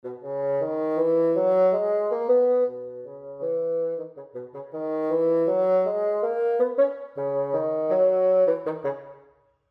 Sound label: Bass Clef Example